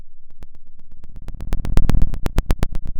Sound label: Sound of a fire crackling